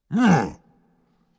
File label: punch; bumbino